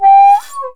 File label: SHAKU FALL; Midjwiz & Shaku